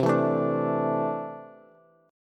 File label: C 6th Add 9th C down Bass; strummed; JGuitar